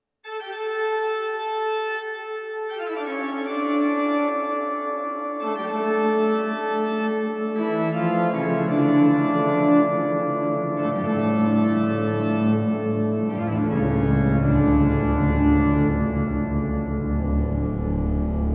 Organ Hall; Sony DRE 2000; Sony MU-R201

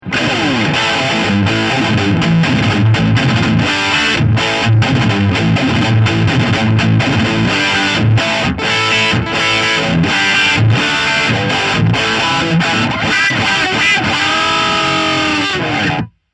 Pentode Bridge PU

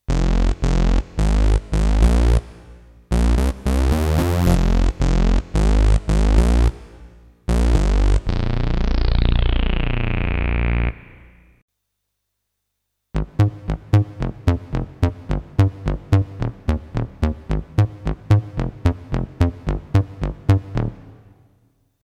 Synthesizer Bass; Casio CDP-S350

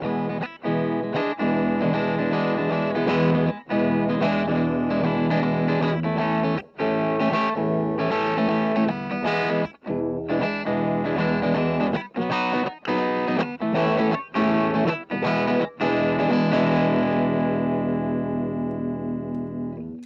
Crunch

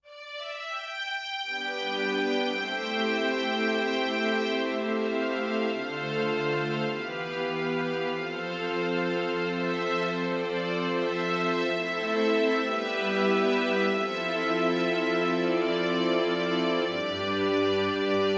Strings

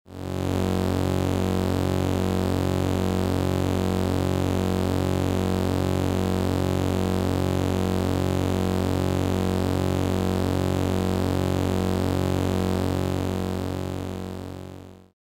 PPG System 300

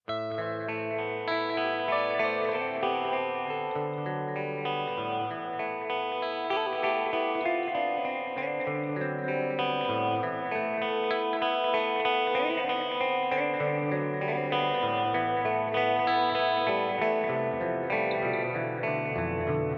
Room Reverb Source Audio Ventris

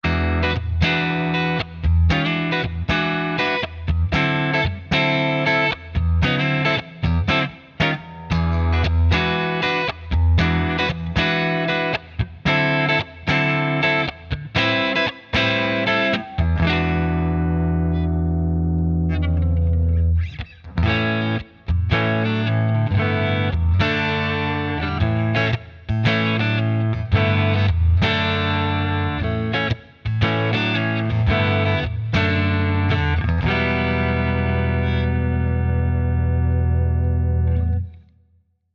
Warm Clean; Gitarren-Plug-ins im Vergleich; Archetype Petrucci

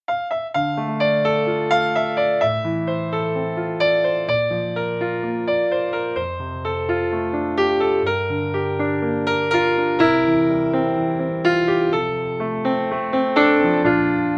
Nord Grand Silver Grand

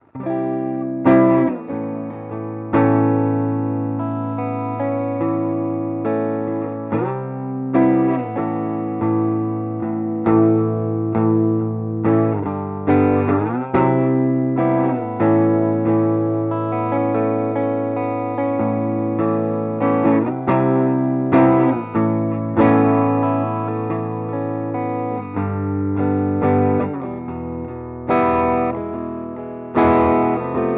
Mooer GE300 Lite Marshall Clean Acoustic

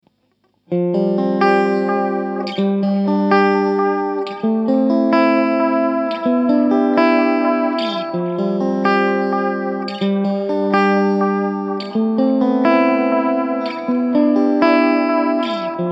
ARP lofi

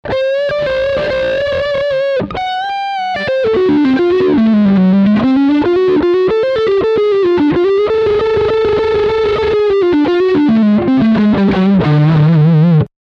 Lick Front pickup

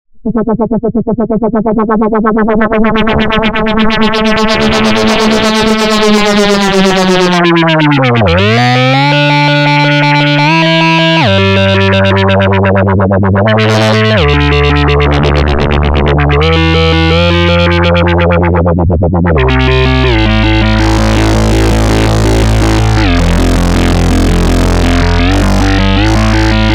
Dreadbox Polyend Medusa Analog Mono

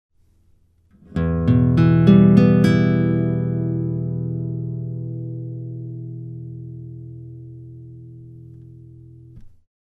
TLA Fatman + AKG C3000b

